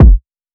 Heavyweight Kick

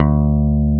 D2 bass

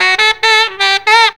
UP UP, JAZZY SAX; Zero-G; Funky Elements

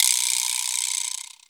noisemaker; AKAI S6000 CD-ROM - Ultimate

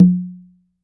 L CONGA OP; AKAI S6000 CD-ROM - Volume 5; CONGA 2

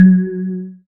SYN WATER D, Microwave

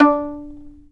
fiddle pizz D4 L; Sampleheads; Whole Lotta Country 1 & 2

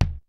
KICK SNARE; Kid Nepro; Elite; KICKS SNARES

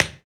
TAP, Perc Toys; Spectrasonics; Supreme Beats; World Dance

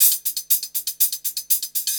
120 HIHAT

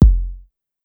Kick 909 Down Fast, Clavia DDrum-AT, Pitch Env; Pitch Env Various

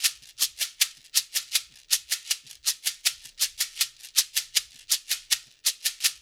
LOOP, SHAKERS, Percussion

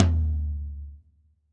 LW TOM, HR-16 DRUMS; Drum Mashines; EdgeSounds

